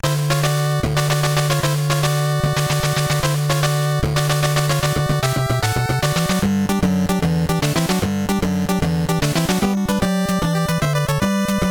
Total Victory; Toukon Club; NES; 2nd Loop

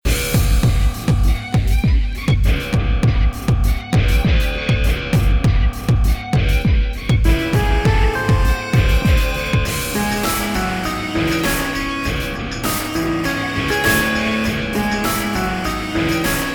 Bossbattle; Aeternum Quest

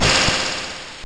Multi Attack

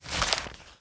sfx Menu Advance; lego ninjago rise of the snakes

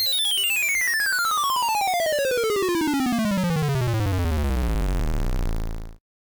Long Fall; 1001 Spikes

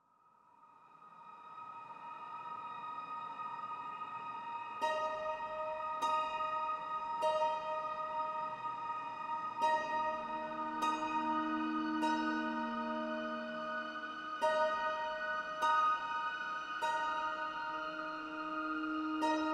amb end zither; sky pillar; Tyranny Gold Edition

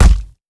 bullethit player

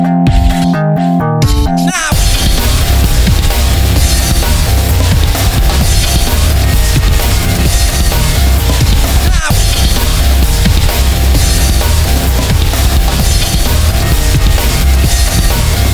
Rock; Spacebourne

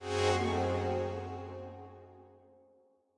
full horns; Sky Children of the Light gamerip

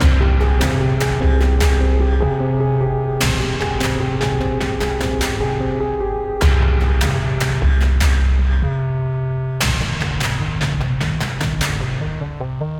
Boss Combat; Griftlands

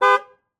Corvette Horn

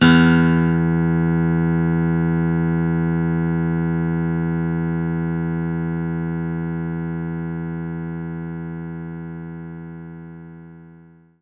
Steel Guitar E3

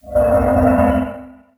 Ghostly Presence; lego ninjago rise of the snakes

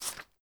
Paper Drag start; in-game sound effects; Papers Please; android ios windows gamerip 2013

